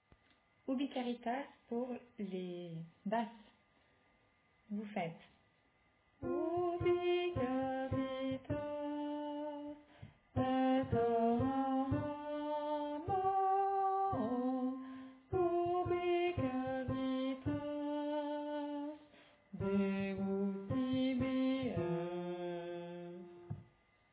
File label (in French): Basse